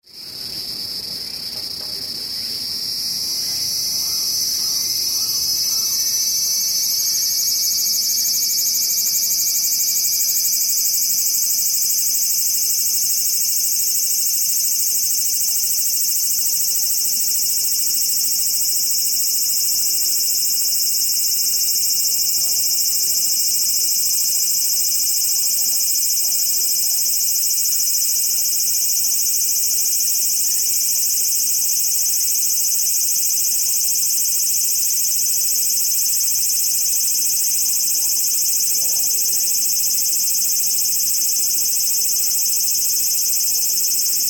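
Insect Call Cicada Sci Fi Sounding Long Tokyo Japan Cemetery; Strange insect song, crickets / ci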